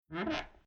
Household Old House Quick Footstep On Wooden Floorboards Squeaky Eerie; Creaky Door